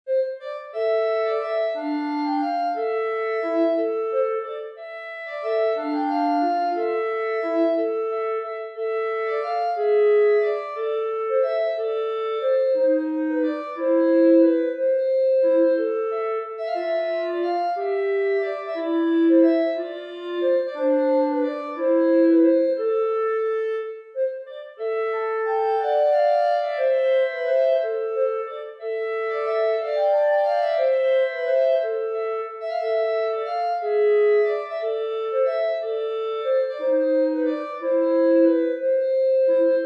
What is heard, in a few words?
Canzonetta Bb Clarinet Duet; Rimsky Korsakov